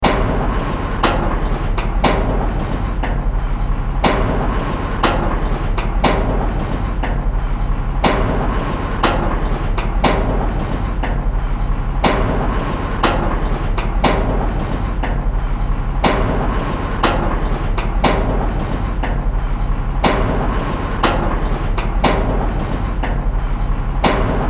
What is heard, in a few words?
Steampunk, Device, Conveyor, System, Science Fiction, Loop, 5.1